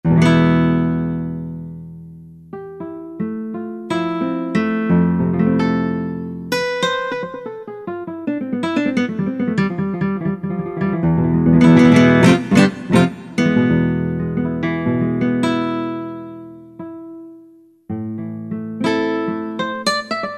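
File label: Guitar Nylon, Yamaha TG500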